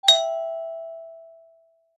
Doorbell single ring

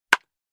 Baseball hit wooden bat

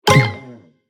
Cartoon confirm button

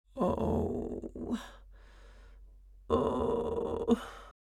Mature female tired moan

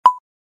Digital tone system beep

